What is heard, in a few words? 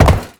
Land Perforated Metal; Movement; Warframe; Uriel